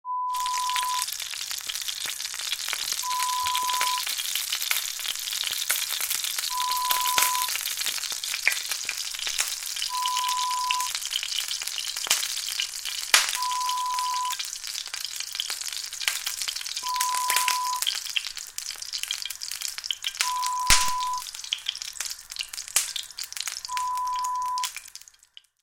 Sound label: Frying Sounds